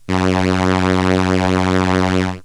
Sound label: Solina Viola F#1; Analog Synths; Ableton Foundation FreePacks